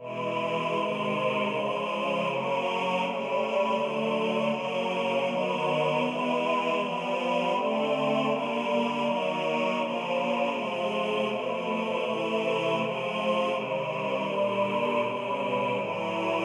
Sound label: bass version